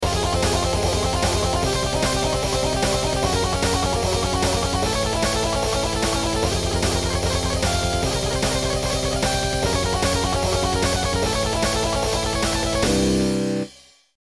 solo

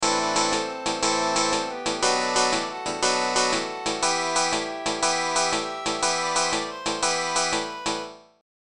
ethiopian soundz

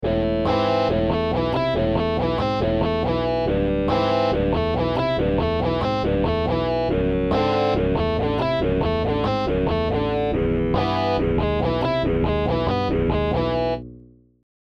Arpиge 4, 5 string Bass; Scales and Arpeggios

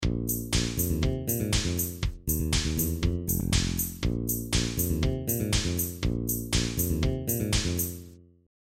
Bass

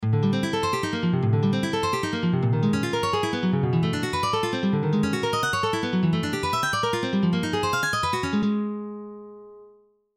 Ascending Sweep exercise

